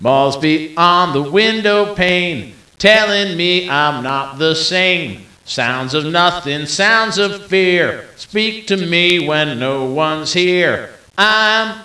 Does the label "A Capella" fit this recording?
Yes